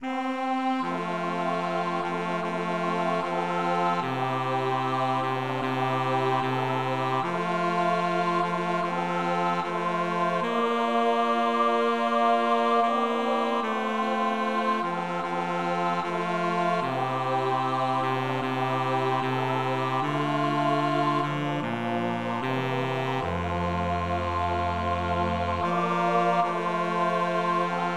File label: bas